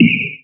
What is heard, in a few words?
Percussive FX; Vengeance Samples; VEH1 Percussion